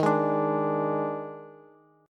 Eb Major 7th Suspended 4th, Bass; strummed; chord; JGuitar

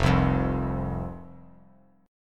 F 5th F, down, chord; F5 strummed

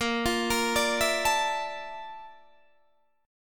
A sharp Major Sharp 11th, Guitar, DADGAD

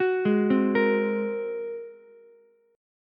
G sharp 9th G sharp Ukulele C6; G#9 arpeggiated; 11,8,8,13; JGuitar

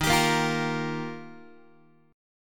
D sharp Major Add 9th, Guitar; strummed; D#add9; JGuitar

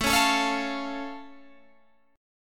A Augmented A down Guitar Open G

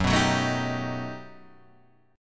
G Augmented Major 9th

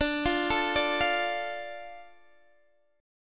D Minor D Guitar Open D

